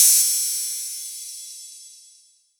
Hihat Open

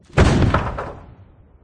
drop piece; There; ThereClient; gamekit; racekit

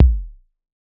Kick; Ableton Live 12 Lite; Core Library; Drums

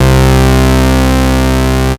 Synth Note Moogy

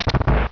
key door open; Open Zelda; Quest Designer 2.0.4b

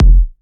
Kick Analog

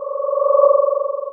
hoverbug idle; There; ThereClient

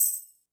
Tambourine; Ableton Live 12 Lite; Core Library; Drums

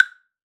Wood Block High, One Shots Drums

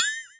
Flexitone; Drums; Misc Percussion

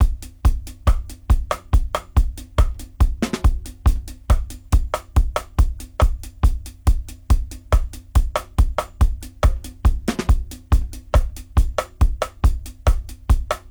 Reggae 140 bpm, drums; Loops; Ableton Live 12 Lite; Core Library

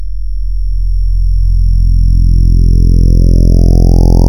Retro Sweep Saw; Ableton Live 12 Lite; Vector; Sprites

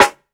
Snare, drums; Ableton Live 12 Lite Core Library

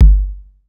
Kick Spacey

